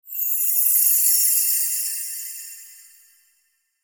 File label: Shimmer Vocoder Swell; Blastwave